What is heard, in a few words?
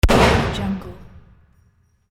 shotgun blast heavy bottom end